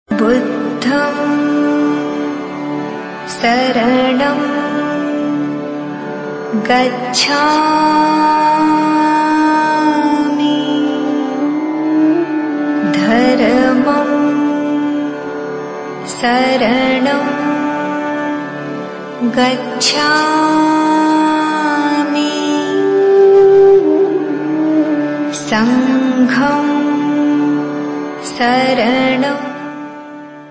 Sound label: buddham saranam gacchami dhammam saranam gacchami mantra ringtone